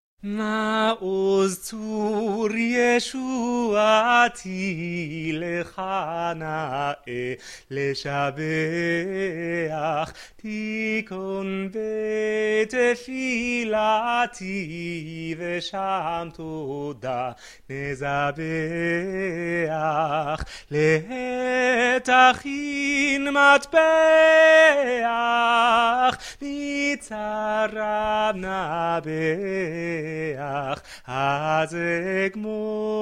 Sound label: sopran, choir; Maoz Tzur